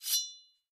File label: play button; Skillzgaming; battle Mania Desktop